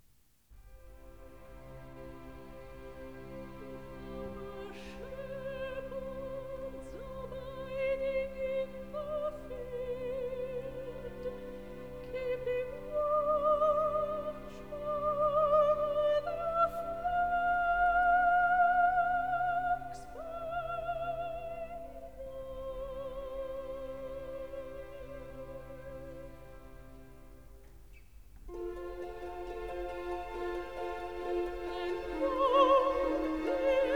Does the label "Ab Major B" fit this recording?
No